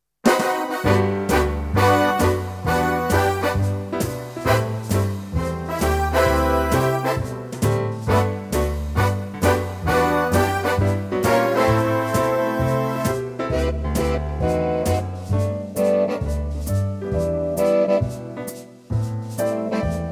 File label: G Big Band; key - G - vocal range - A to E; Livetrak Big Band arrangement; Lightly swinging, lots of punch and hits